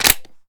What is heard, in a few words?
reload quick magin, weapons; gmod; viper